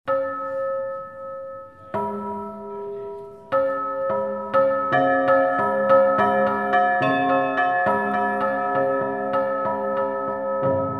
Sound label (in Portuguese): Piano e Cordas